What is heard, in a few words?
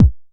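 909 kick short, E-MU Proteus-2000; 300 Drum Machines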